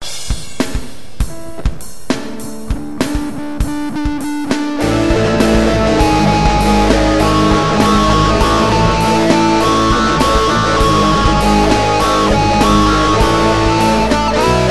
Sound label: bass